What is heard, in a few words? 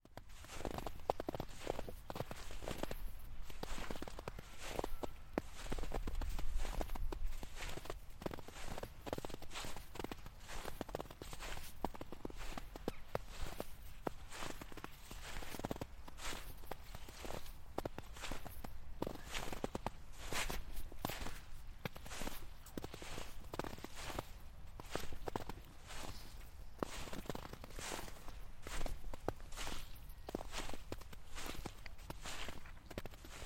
Snow walk; Snowy walk, Bentham, North Yorkshire, UK in early January 2025; Charlotte Petts; Walking across some snowy fields with my dog, Toby